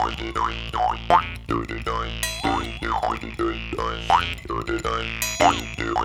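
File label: 80 bpm, Boing; Spectrasonics - Supreme Beats - World Dance; Ben Boing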